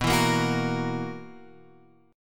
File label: B Major 7th Suspended 4th, B, down, Guitar Open G